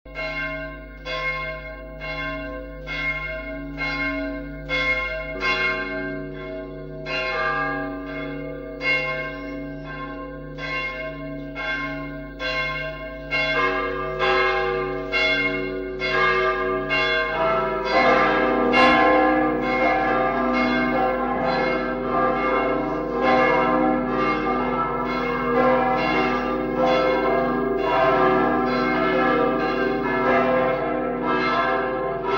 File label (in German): Glocken zusammen; Zeidner